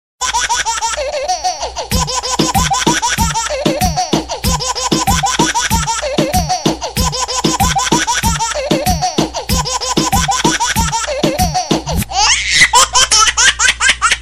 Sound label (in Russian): detskiy smeh